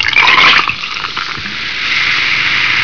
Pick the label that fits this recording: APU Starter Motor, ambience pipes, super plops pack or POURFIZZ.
POURFIZZ